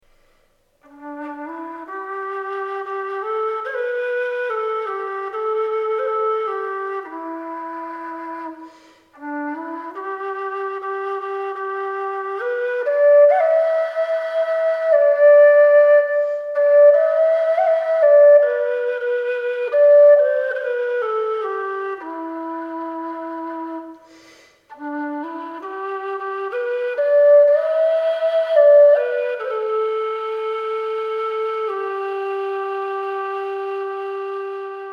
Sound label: low d whistle